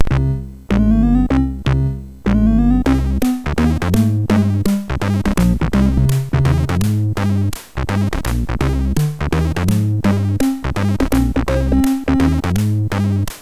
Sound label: Jazz Miniature, MOS6581R4; hvsc; Froyd